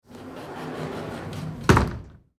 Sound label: Sliding Glass Door; Household; Sliding Glass Door Close; Sliding Doors